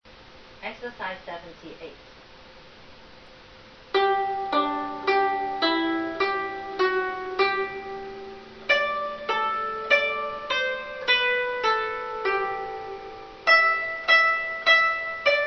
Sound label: zheng